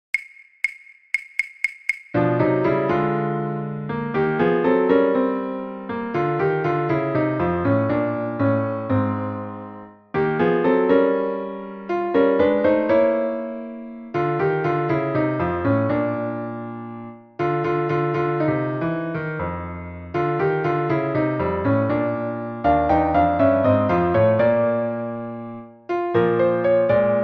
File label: Piano